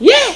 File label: Get Bonus Points; Casady & Greene; Crystal Crazy Demo